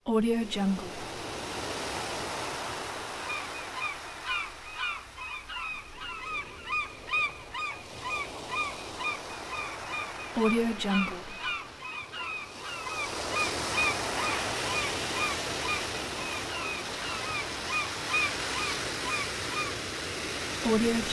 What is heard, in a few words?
seagulls on beach